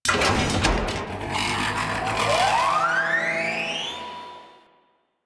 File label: Thumper Bot Thumper Charge A; Warframe; Grineer; Thumper Impact